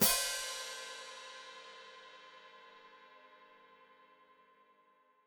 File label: Hat Closed Open, Hi-Hat